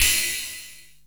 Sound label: Perc, Korg Wavestation